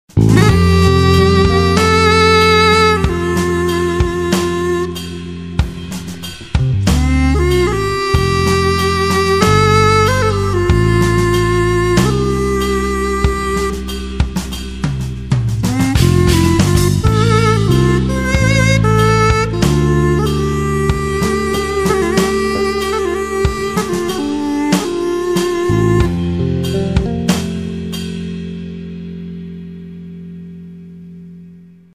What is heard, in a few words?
wooden sax sopran